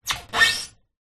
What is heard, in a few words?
vehicle car tyre rub squeak metal